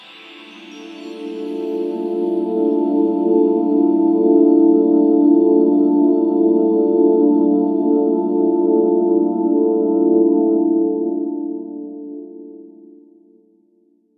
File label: D&B Pad; Keys; neuro